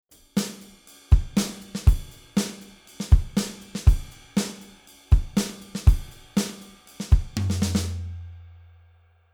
Drummer Color Off 60Hz 3dB Bell 8kHz 2dB Shelf, Artistic EQ; Fredenstein